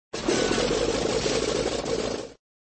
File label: Sound Ramp Not lit (plane not starting), flipper; Ramp Not lit (plane not starting) v2